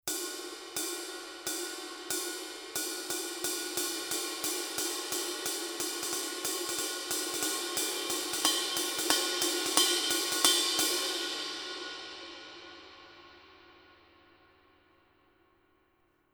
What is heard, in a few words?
20 thin pattern, paiste; masters